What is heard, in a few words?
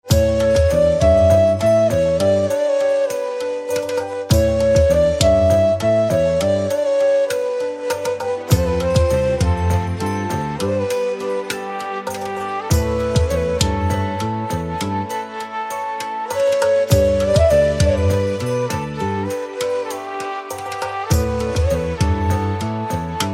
Flute